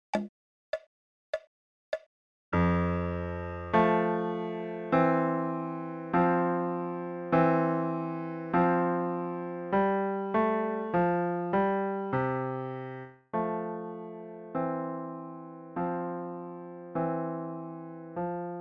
Harmonious Blacksmith LH

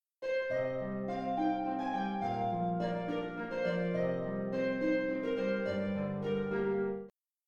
Natural, scales Minor; Greensleeves

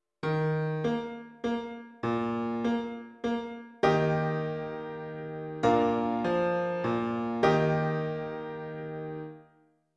Piano

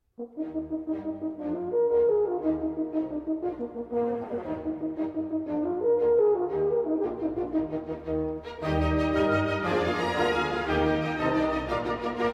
Horn

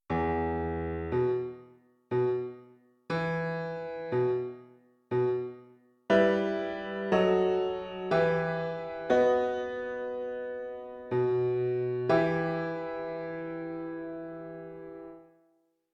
Piano

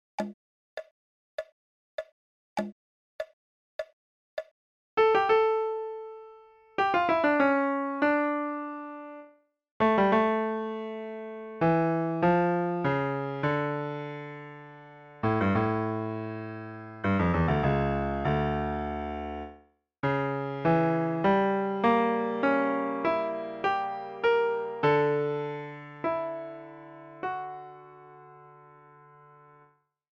Toccata Fugue Dm

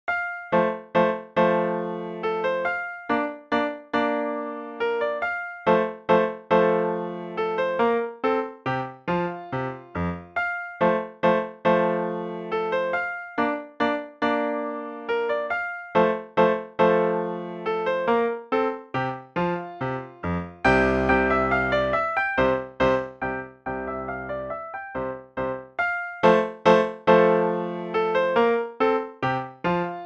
Leopold Minuet

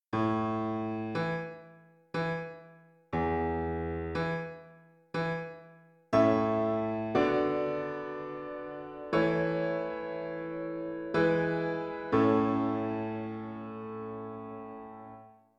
Piano

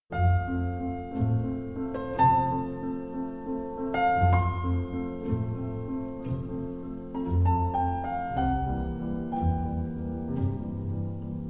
Tonic scale degrees

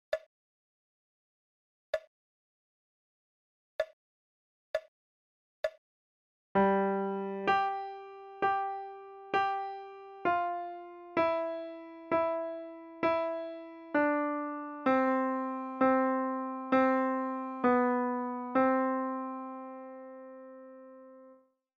For Health Slo

